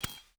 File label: lock Closing; SecurityInterface